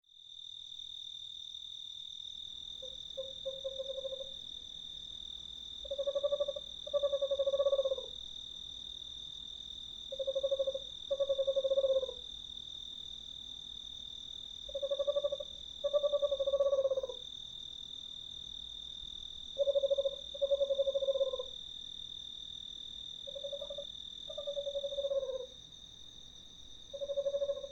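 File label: western screech owl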